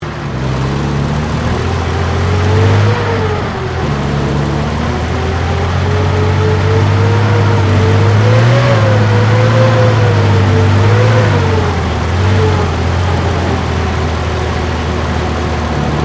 TANK CENTURION MANOUVER, British Centurian main battle tank; Trucks (Sound Effects:Vehicles); SoundEffects-Foundation4